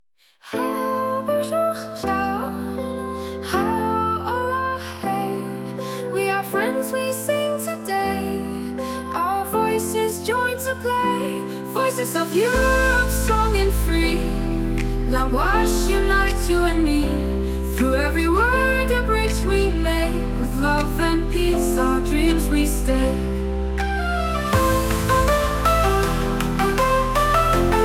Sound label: europe anthem; journees europeennes des langues